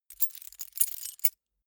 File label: key ring mid size rattle; objekte; schluessel